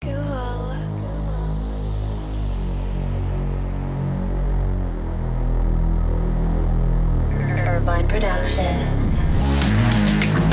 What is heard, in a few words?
remix